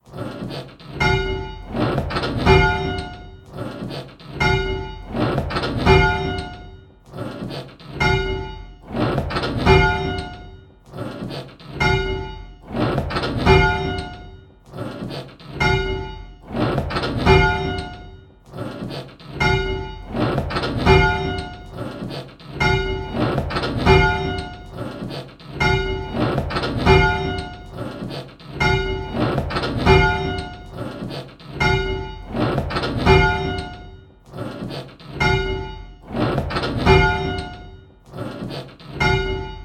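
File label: bells, 8 Bell Games; Just One Bell; Pebworth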